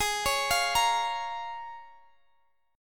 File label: Bb Minor Ab; Bbm/Ab arpeggiated